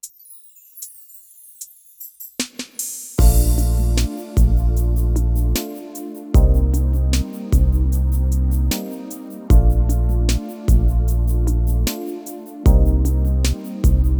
tyros3 style ballad analog ballad, yamaha

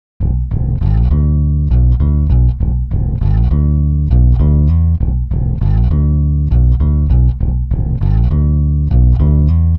bias peak, pitchshift bass

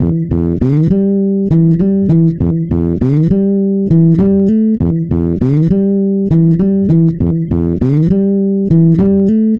bass, pitchshift; prosoniq time factory mpex3

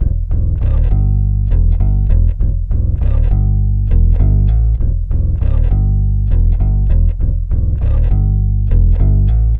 bass, pitchshift; serato pitch n time le

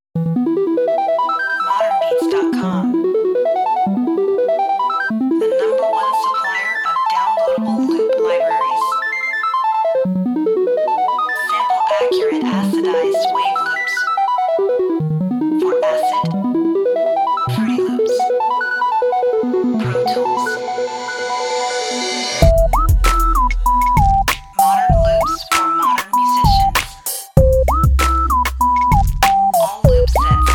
Xtreme Synth Loops, synth loops; bibliothèque d'échantillons de synthés; ModernBeats

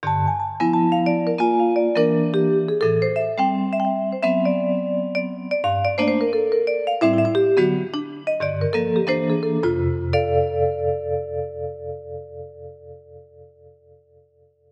vibraphone, yamaha tyros3

